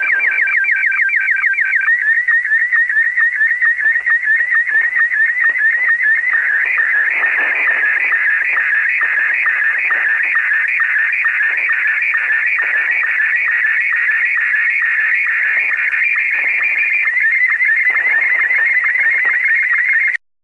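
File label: slow-scan television; sstv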